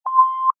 Sci Fi Beep; 1001 Sound Effects

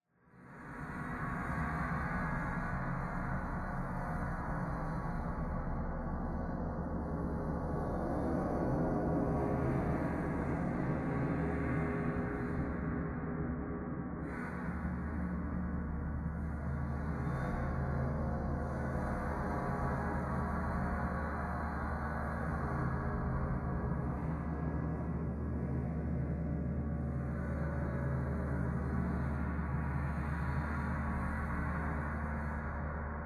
AMBIENCE Cyborg Temple; boom library; Matter

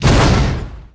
Explo Small Bomb; Destruction

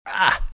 hurt; RadwinIsland

